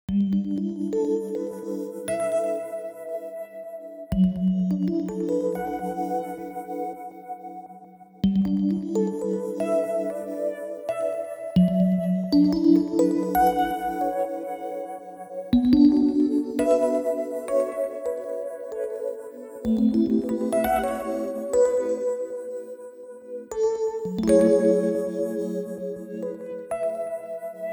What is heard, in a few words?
Chords